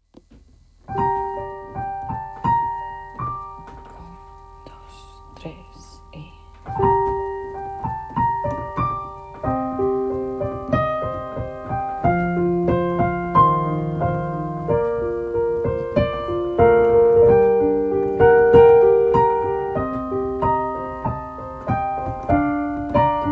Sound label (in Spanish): instrumental; malinche; cdmx; casting malinche mexico